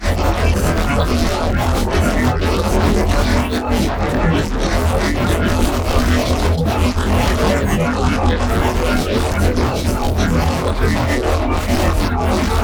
Bass Granulize E

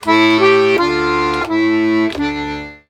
accordions; USB Soundscan vol.40; AKAI

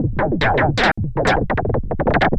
Fireworks Gallop, Noise Percussion; Sonic Foundry (Sony Creative Software); Ma Ja Le Ethereal Textures

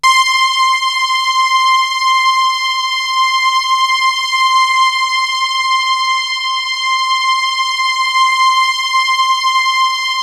SYNTH LE; MORPHEUS; Syntec; Wall of Sounds

